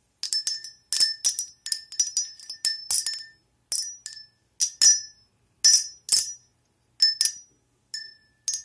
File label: blue chimes, windchimes